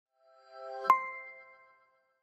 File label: Additional Alert; Lumia Ringtones